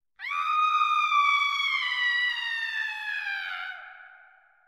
Woman Falling

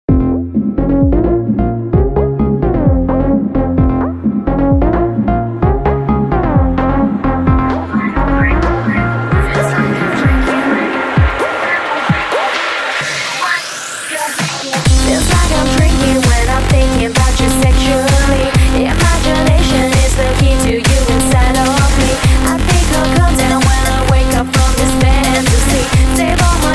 Techno

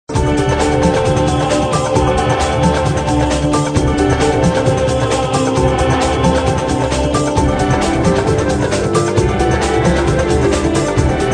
Loop

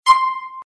alert; GTA iv

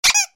Squeaky toy notify

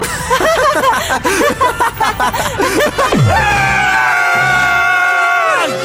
Ahahahaaaaaa

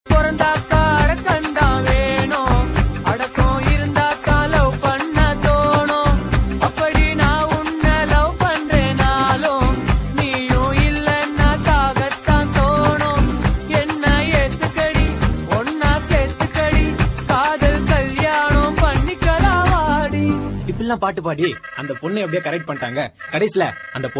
Tamil Remix

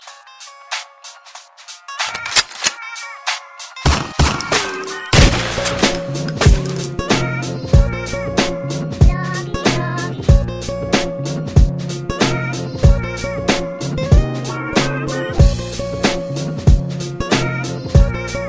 Song With Shots

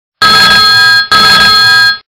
Dring

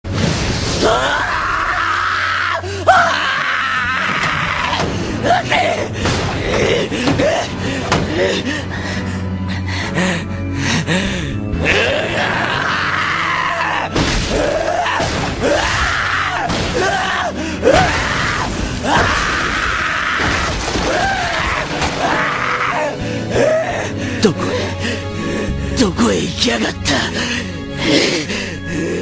Screams; Keiichi

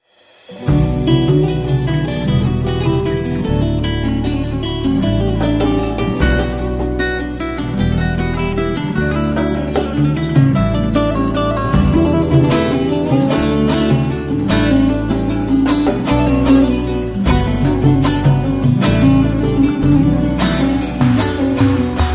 Kurdi

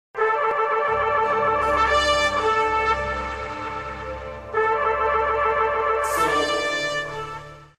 Sms Tune; Endhiran

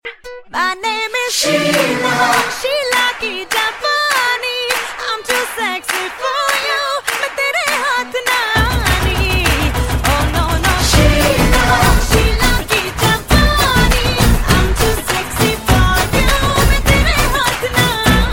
Claps Mix

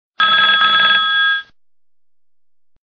Old Phone Tone

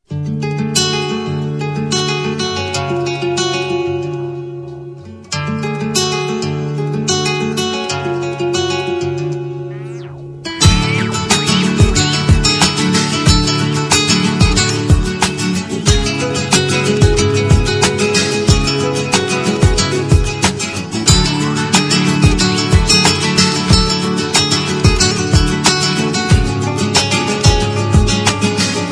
Light Guitar

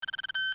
Lcars computer

